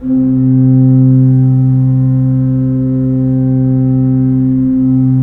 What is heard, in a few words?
3D Pipe Mix Celeste, 3D Organs; E-MU Producer Series Vol. 5; 3-D Audio Collection